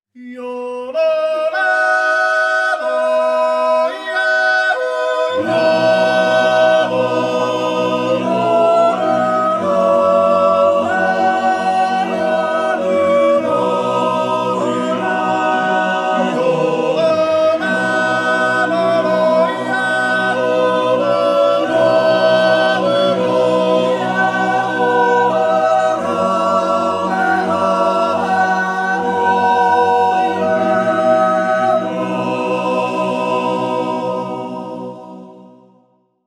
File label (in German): aletsch jutz